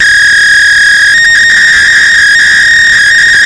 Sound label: 56k modem squeal; Sound FX Real Life